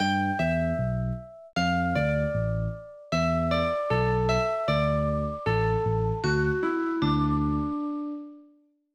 Music Lose; Tales to Enjoy Puss in Boots

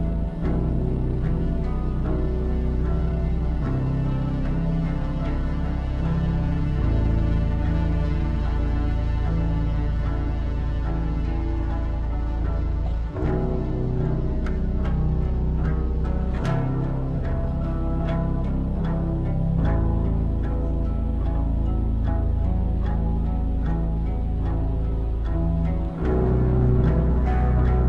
Dark Pick pitched loop; Griftlands

